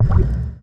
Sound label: menu big info hidden